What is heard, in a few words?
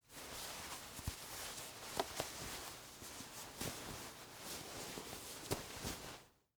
Wool Coat Unbutton, Clothes, Buttons; Foley; Dressing; BlastWave